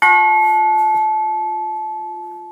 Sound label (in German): Glocke